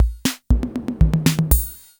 FILL, KR55; INIS; Funky Xtreams

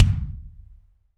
BD AMBIENT, Drums; Real Mega Drums

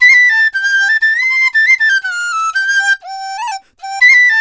DIZU, 90; INIS - Opium

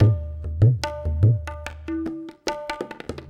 Tabla

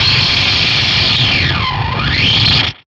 fx outburst; One Shot FX; Sample Magic; Transmission-X

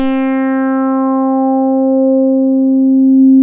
MOOG MDLR C4; Hollywood Edge - Giorgio Moroder Rare Synthesizer Collection

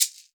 SHKR LEGG, Synclavier Percussion; Synclavier Percussion 2; World & Orchestral; ILIO

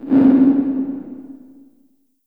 LH MC808, REVRB LARGE HALL

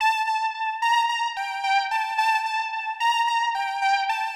Synth 110BPM; sci-fi

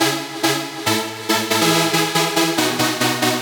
140BPM C#; Vengeance - Essential Clubsounds Vol.4

